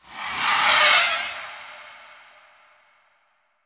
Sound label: SKULL CAST